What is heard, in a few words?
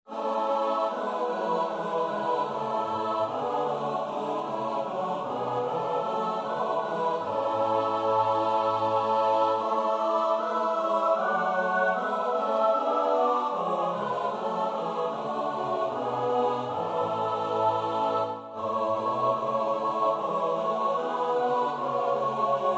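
soprano alto tenor bass; Bach (Johann Sebastiaan); BWV 197; Du süße Lieb, schenk uns deine Gunst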